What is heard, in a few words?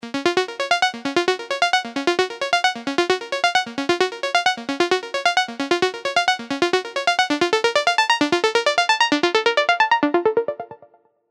Spot, serum arps